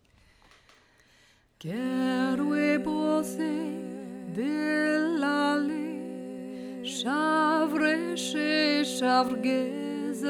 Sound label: tutti; helin